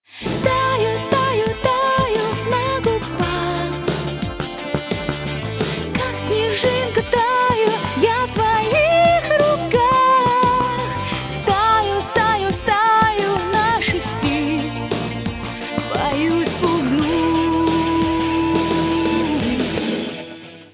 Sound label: music 8 kHz; 128kbit